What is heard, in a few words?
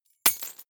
key ring large drop parquet; objekte; schluessel